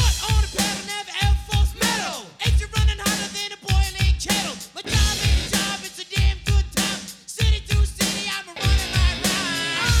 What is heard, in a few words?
Polyfonic signal; Separation of Sound Sources by Convolutive